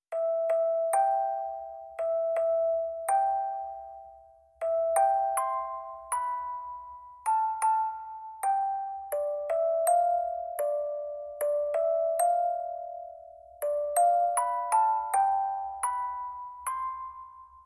Music Box

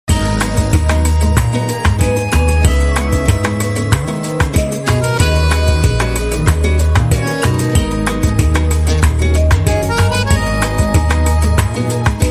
Bossa Inst

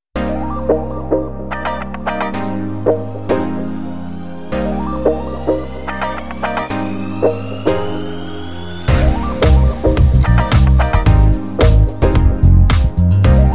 Foxy Funky

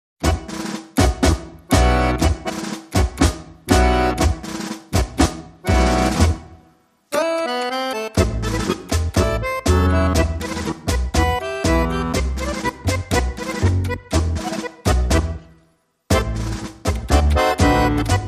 No Guitars No Violin

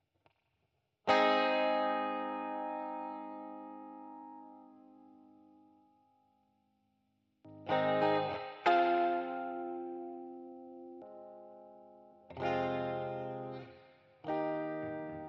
Guitar

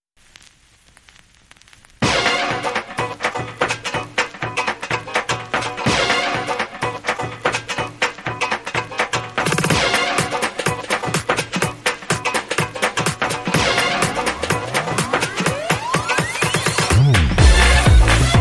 Swing Jam

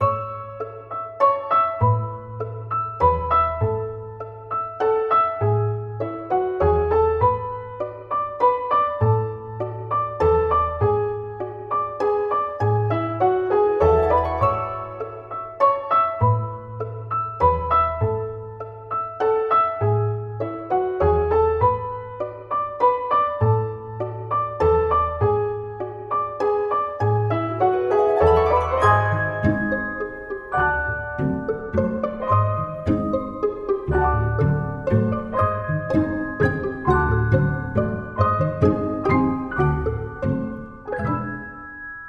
Up To The Clouds, Plucked

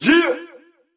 Holler Yeah

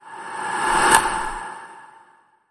Table Serve Whoosh

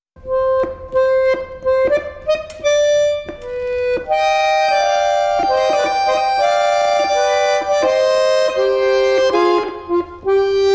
Accordion Solo

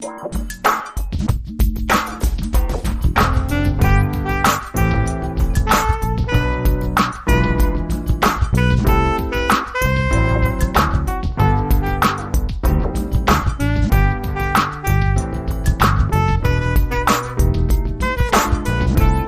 Christmas Tree; Trad (PD)

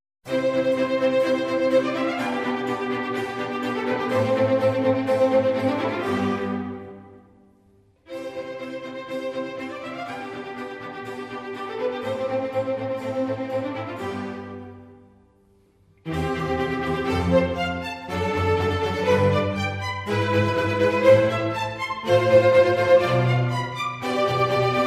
Sinfonia for Strings in C major; RV112; Antonio Vivaldi

